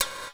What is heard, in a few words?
PERC GATED; Crypton; DrumBase5000